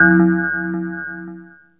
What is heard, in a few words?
ANALOG BELL; ANALOG BELLS; Ambient; Zero-G; Ambient 1